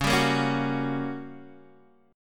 G Minor Major 7th Flat 5th C sharp

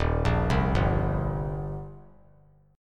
D sharp 6th; arpeggiated; 3,5,3,5; JGuitar

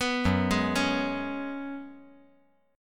Gb Suspended 2nd Suspended 4th

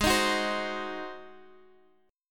G sharp 7th, Guitar, DADGAD; 18,18,16,17,x,x; down; chord shape

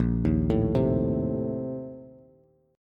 Bb Major 7th Suspended 2nd Suspended 4th, Bass; arpeggiated

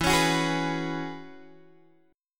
E 6th Add 9th E down Guitar Open G

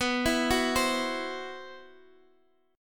C Major 7th Flat 5th B

